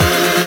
Synth Sound; Vengeance Samples; VEE Synth Shots

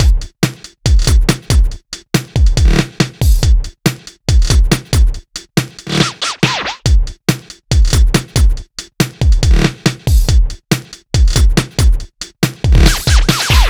Loops BB 140, BreakBeats; Vengeance Samples

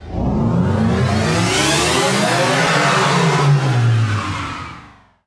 Uplifter

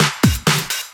Fill Ins 128BPM; Vengeance Samples

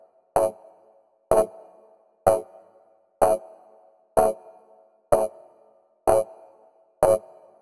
126BPM Chords Root F#; Vengeance Samples; Malta Kit

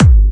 Bassdrums UK Trance Kicks; Vengeance Samples

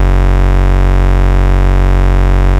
Root F#, Bass; Vengeance Samples

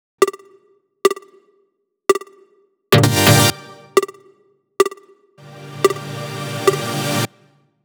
128BPM Wobble Synths Root G; Vengeance Samples; Wobble Kit

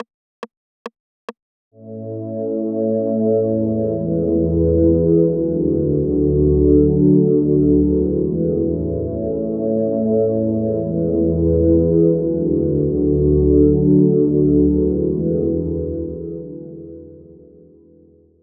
String (warm), 140 BPM; Vengeance Samples

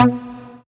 Synth Cuts; Vengeance Samples; VES1 Instruments

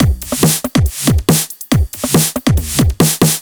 Breakbeat 140 BPM

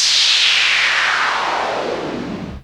Downsweeps

